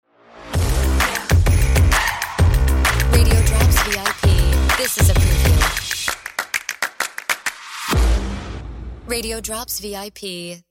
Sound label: Music Bed